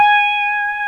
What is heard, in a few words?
PEDAL STEEL